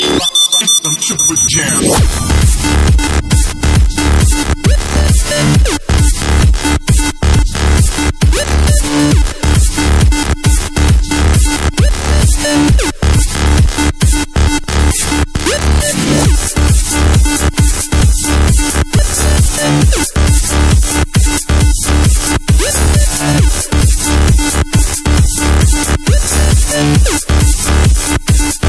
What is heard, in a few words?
Dirty Bass; meloboom